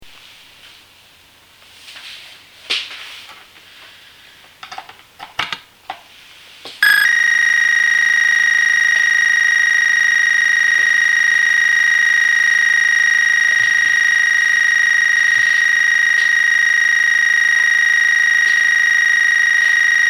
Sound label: Small Resonator